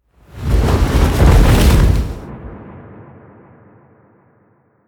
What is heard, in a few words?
whoosh flutter